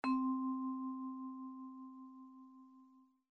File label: vibrophone